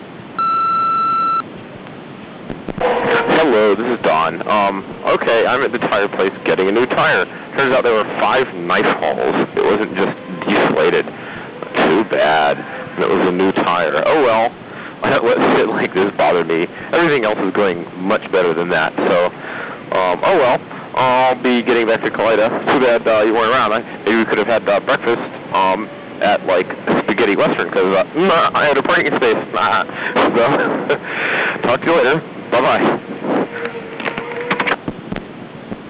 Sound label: slashed tire